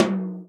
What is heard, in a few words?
tom, Real Drum Kit, jungle kit